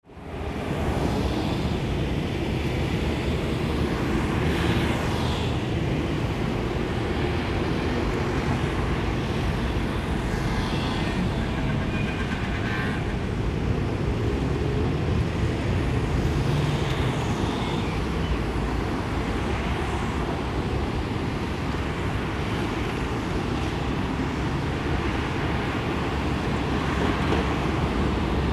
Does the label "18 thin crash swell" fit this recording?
No